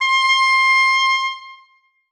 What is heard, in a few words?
oboe c6